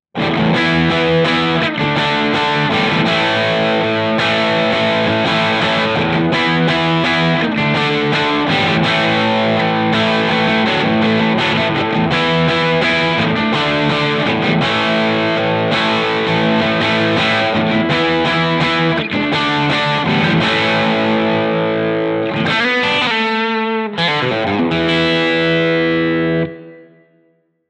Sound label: crunch; jensentone